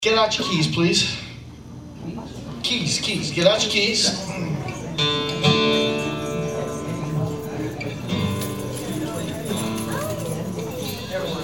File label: Jingle Bells; live recordings; christmas